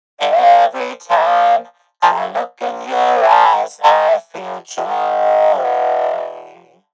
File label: Vocals You are a robot